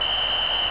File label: whistle